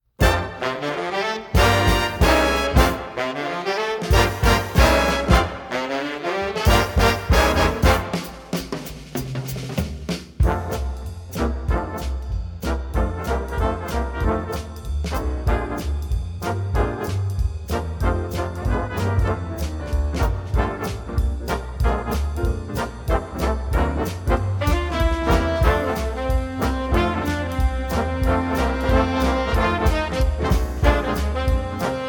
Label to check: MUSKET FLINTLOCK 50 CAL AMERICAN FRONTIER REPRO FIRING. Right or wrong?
Wrong